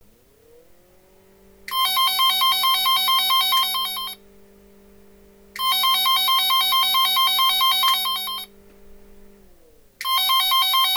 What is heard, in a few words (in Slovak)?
Zvoncek 2 tonovy